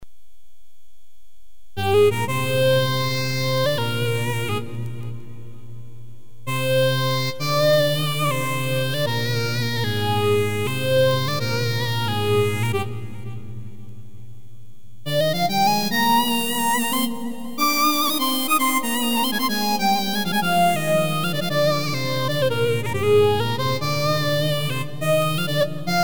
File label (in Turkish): lead